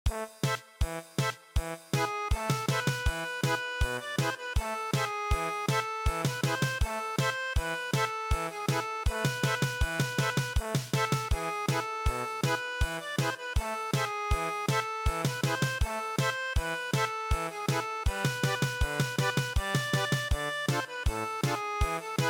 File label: German Polka